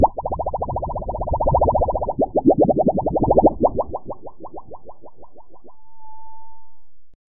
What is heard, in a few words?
gigantic bubble expanding and popping